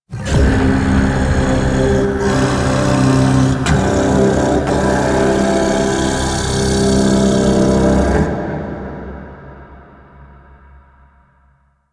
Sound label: Sand Vocals; Warframe; Man In The Wall